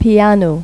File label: PIANO; Pipo-Aprende musica con Pipo